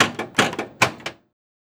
Technology Electronic Pinball Machine Flippers

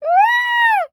Animal Bird Peacock Call; animals; AdobeCC

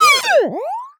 Multimedia Internet CD-Rom Flash Wipe Electronic

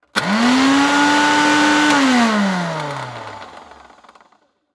Household Blender Pulse Quickly

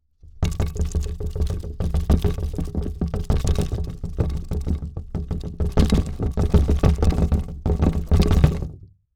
Foley Wood Rattle Fast Earthquake

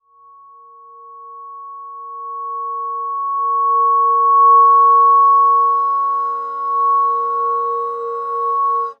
Transition Musical Tone Drone; Production Element

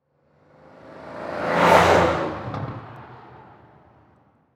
Transportation Car Monte Carlo Drive By Fast

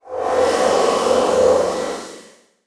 Transition Feedback Sweep; Production Element

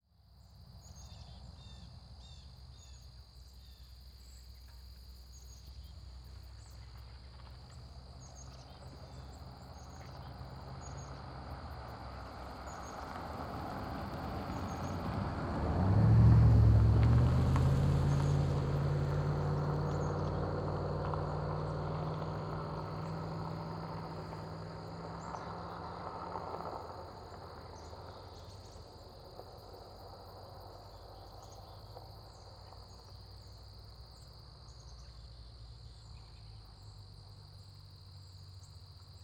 Ambience Dirt Road Near Woods 180; AdobeCC

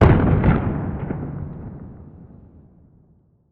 Explosion Distant Bomb; Fire and Explosions

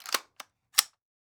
Weapon Gun Rifle M16 Clip Load; AdobeCC

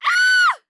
Horror Scary Human Female Scream

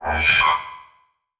Multimedia Internet CD-Rom Flash Hi-Tech

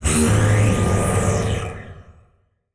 Transition Whoosh Laser; Production Element

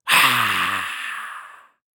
Horror Scary Vampire Hiss Breathe Ha Hiss

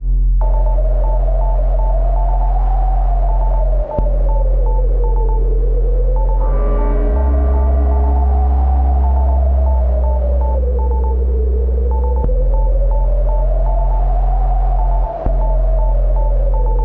Drone Tech Bed; Production Element Imaging; AdobeCC

